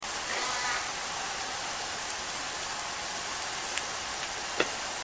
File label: buzz distant